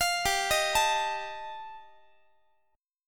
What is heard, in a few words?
G 7th Suspended 2nd Sharp 5th G chord, Guitar; arpeggiated; JGuitar